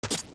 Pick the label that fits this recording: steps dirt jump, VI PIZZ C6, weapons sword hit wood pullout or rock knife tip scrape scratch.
steps dirt jump